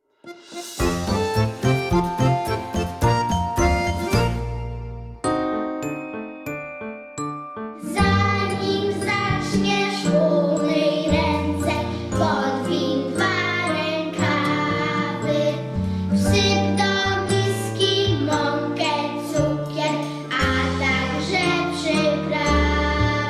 wersja z glosem dzieci

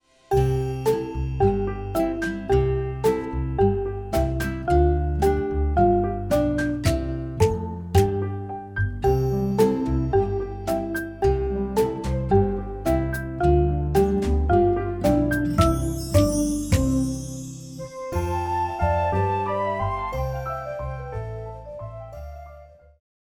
wersja instr

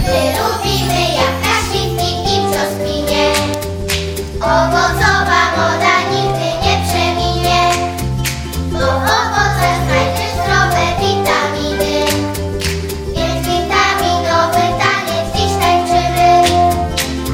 wersja wokalna

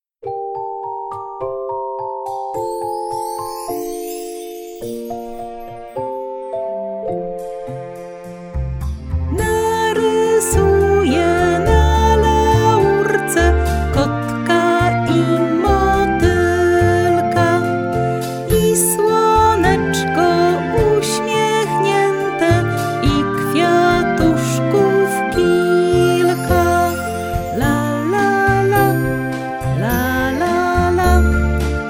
wokal

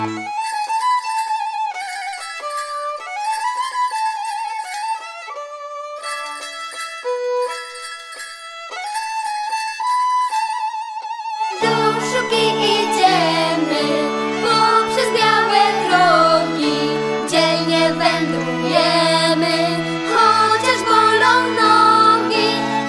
wersja wokalna

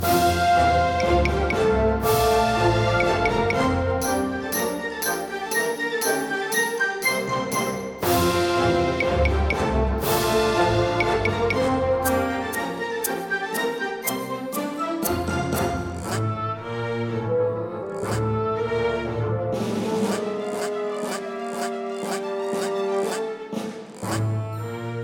MARSZ piratow instr

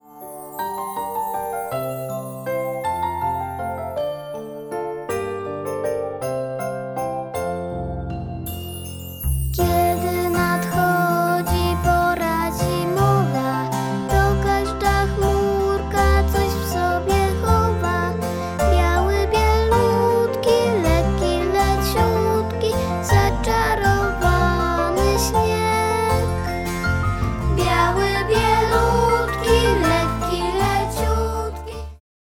wersja wokalna

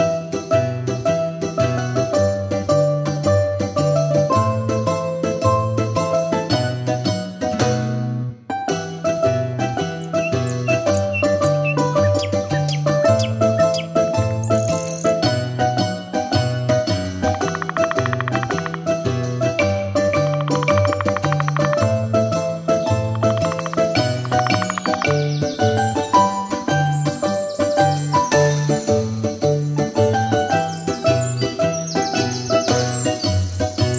TARANTELLA wersja instr

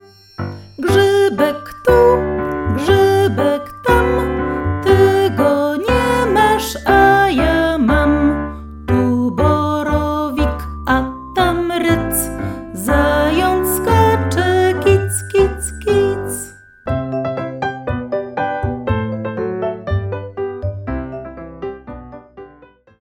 wersja wokalna

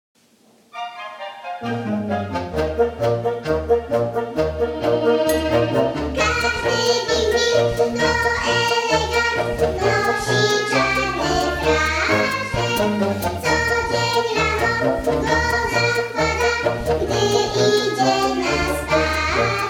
glos dzieci przyklady w roznych tempach

